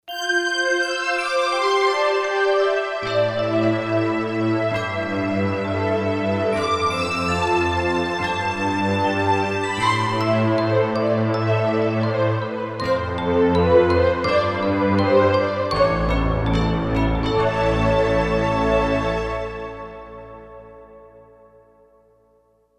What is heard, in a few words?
Orch & Bells, Korg Orchestra; Korg MSC-4S Orchestra 1